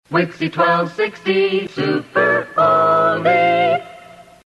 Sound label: Super Oldie Jingle